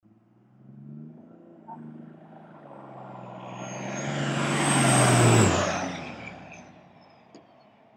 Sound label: dodge flyby